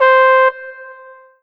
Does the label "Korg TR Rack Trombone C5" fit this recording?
Yes